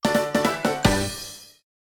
music sting skillshot hit; My Little Pony

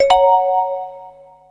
Rewarding Chime; Spelunking the Mine Match

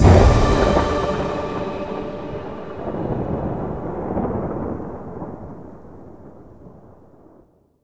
Combo D; Mixolumia

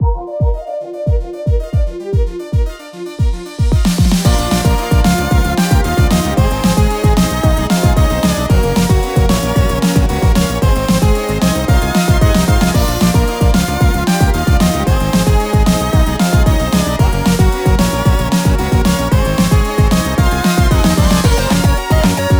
Level Reflective

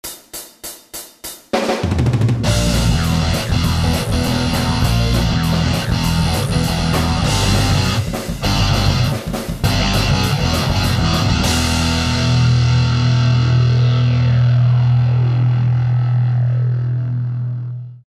CREEPY GARAGE; Miss Teri Tale: Vote 4 Me

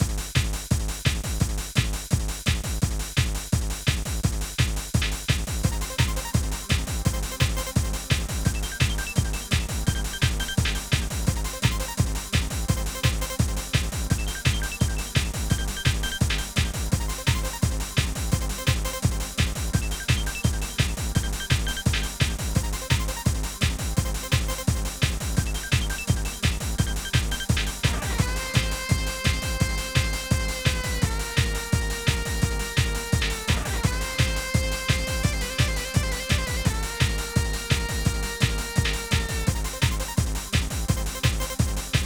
Stage Boss; Stage 6-1; extra bright; ps1

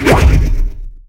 Space world Flying fan jump

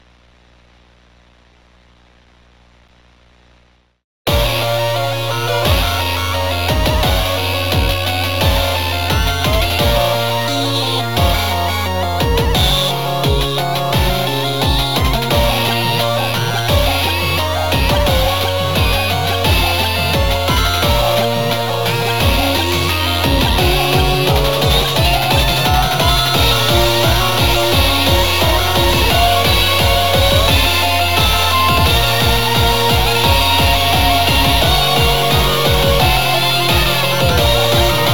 Powerdown (With SFX); Friday Night Funkin' Mario's Madness; PC mod gamerip

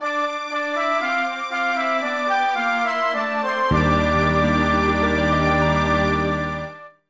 Battle Lose; Fossil Fighters Champions; Nintendo DS

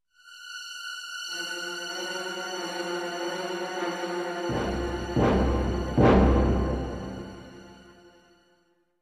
Tension; Fenimore Fillmore: The Westerner

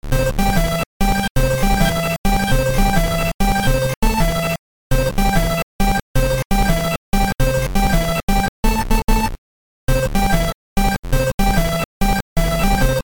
8-bit Micro Tech; Glitch; Symbiants

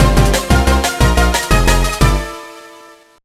Unlocked; trick star; gba

